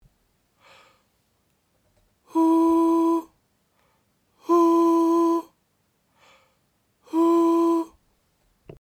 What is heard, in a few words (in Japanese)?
猛禽類